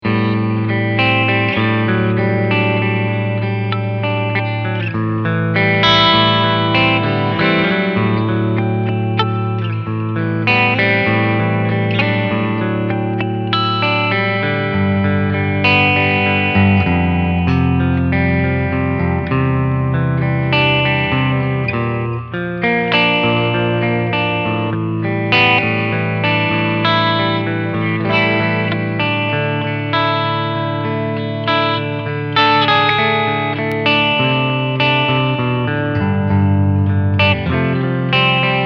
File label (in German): Clean Picking beide PUs